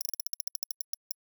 terminal cash counter

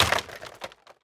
plastic break; teardown; ps5 windows xbox series xs

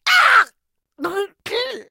small crash; Talking Tom Gold Run

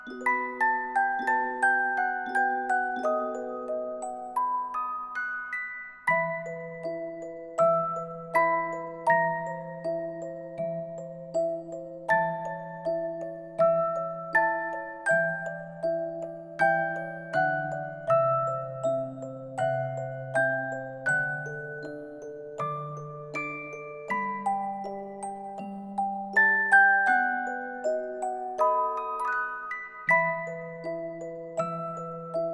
music Box loop; noahmund

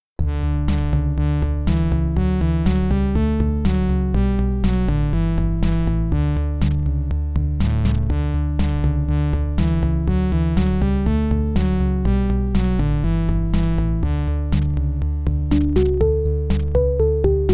IBM-PC AdLib; lemmings

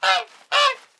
Goose Stands Scream; Farm Mania

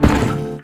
Mariachi Guitar Slam Attack; WrestleQuest

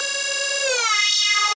Beat

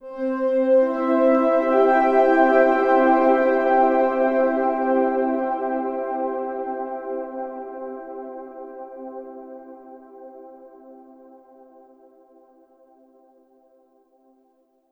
Overlay Tone; Act1 Rezoning

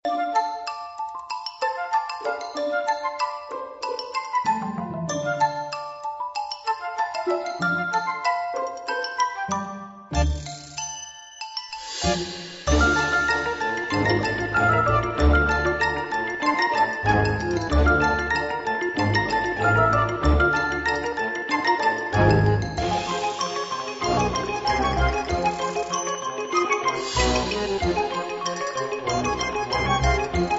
Dark Cartoon; Vertical Drop Heroes HD; PS Vita

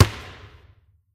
Special Basketball Bounce

